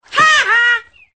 haha; Nelson

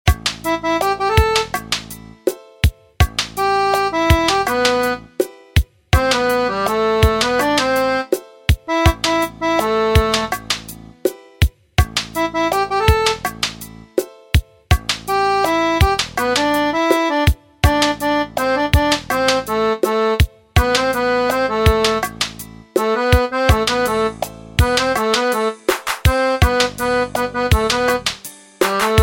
Instr

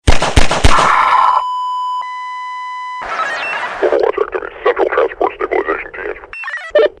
sms; Half Life

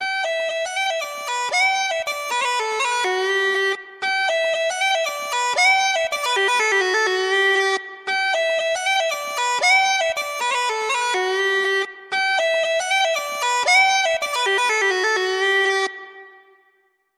bagpipes music; Country

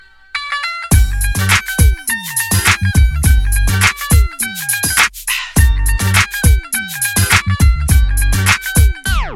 Punjabi ringtone; Indian

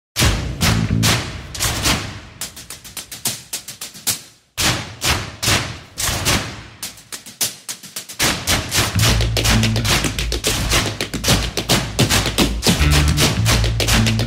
tap song; Dhoom3; Indian

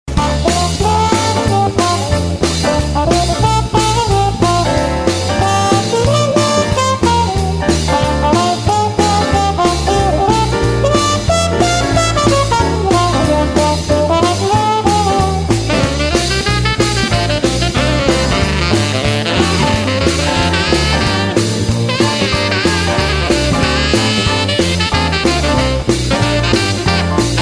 classic jazz

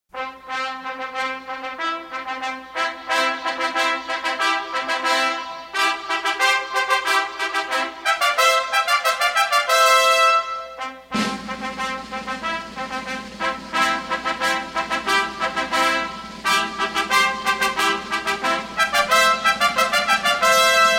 fanfare; Rocky

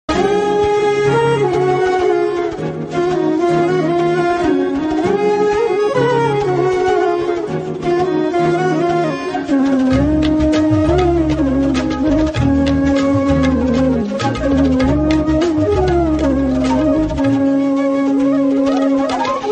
flute; Indian

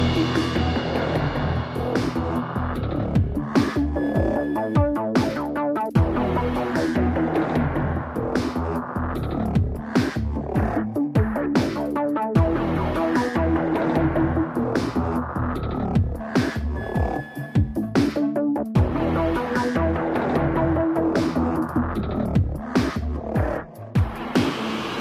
instrumental

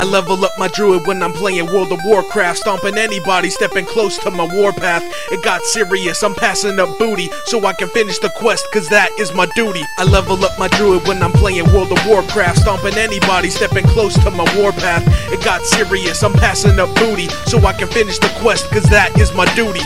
level up song; World of Warcraft

